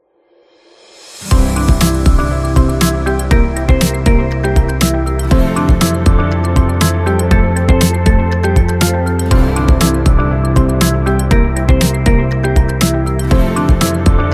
Tech Upbeat

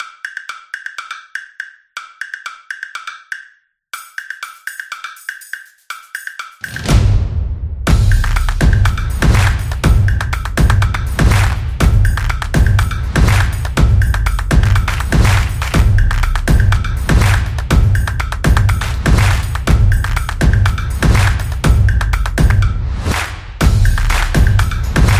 Percussion Upbeat Drums

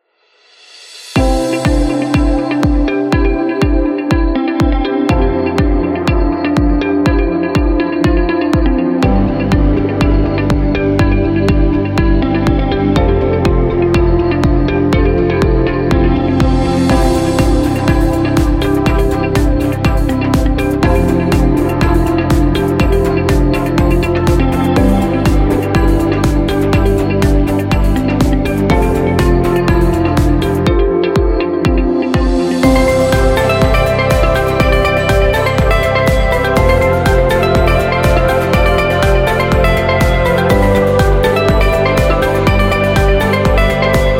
Upbeat Explainer

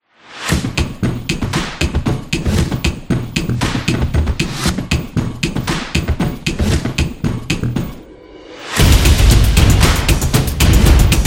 Football Soccer Music, sport promo

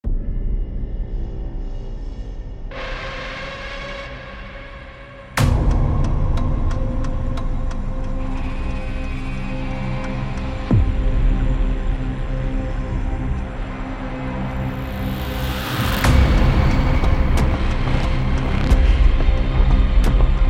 Thriller

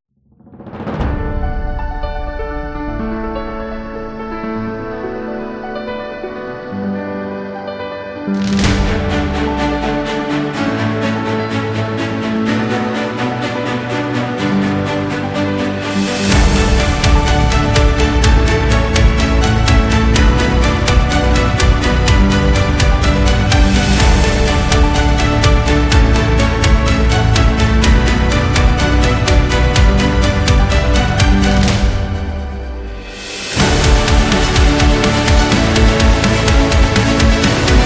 Corporate Orchestral; corporate intro

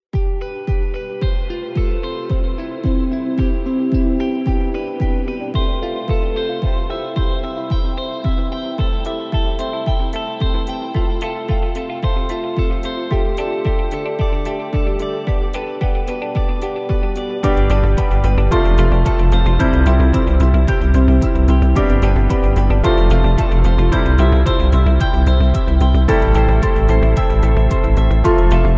Simple Background; healthcare

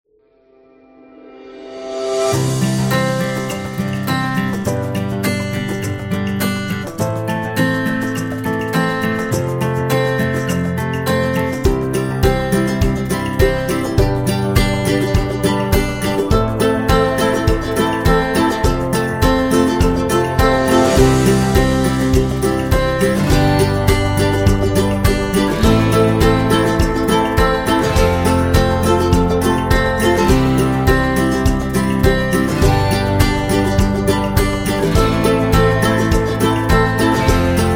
Corporate Guitar; corporate music vol 10

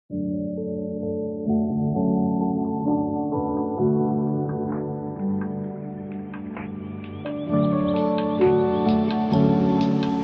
Lofi Beat Chill

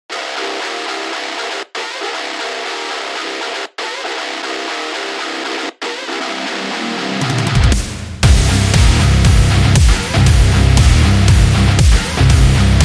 Metallic

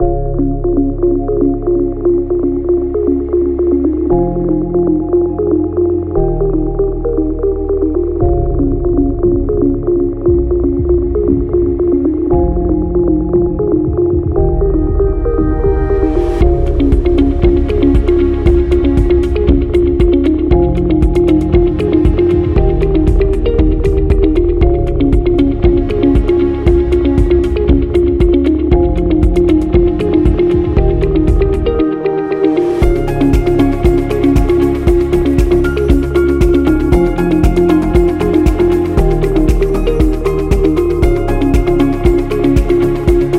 Tech Corporate

